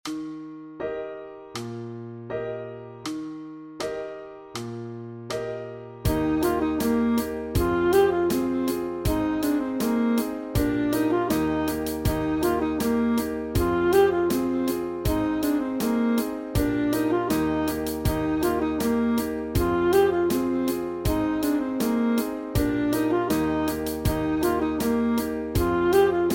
Sax Study

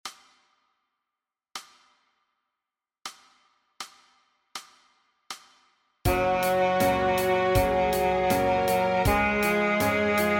Eb Scale

